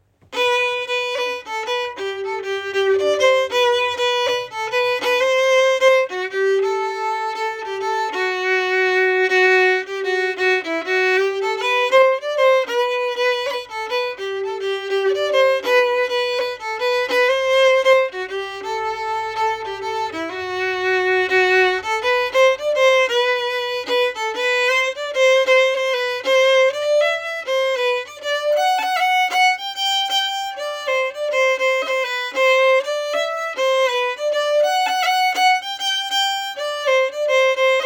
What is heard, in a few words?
korolenko harmony, fiddle